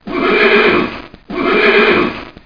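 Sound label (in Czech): KUN, horse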